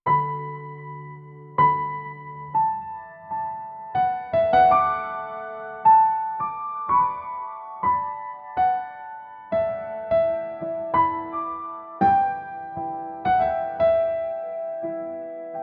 piano romantic

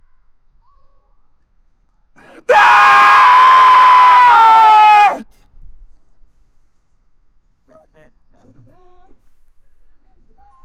a scream is heard

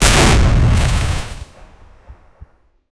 big and strong fire slash

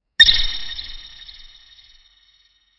a loud sharp pop followed

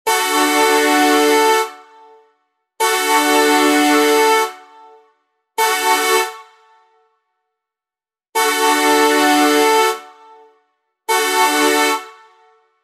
air horn dj

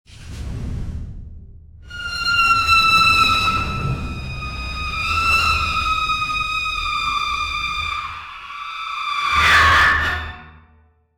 orchestral screamer

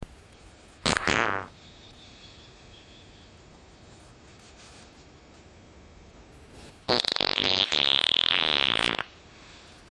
quick wet fart followed by giggling

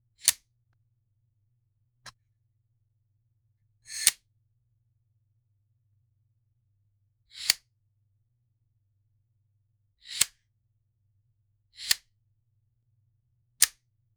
air piston releasing prea

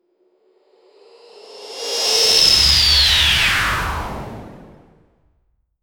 the sound of a superhero taking off with a whoosh

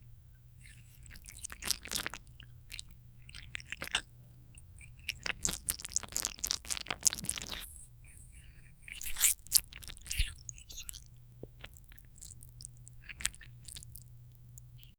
squishy slippery sounds; Sound for a poisonous slime ball shot from a barrel in a game, caused by an enemy’s tilt.

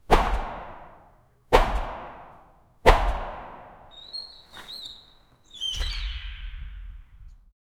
the whoosh of a basketball going through the hoop

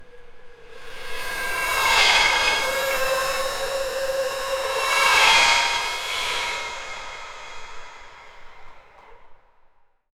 medieval castle drawbridge lowering

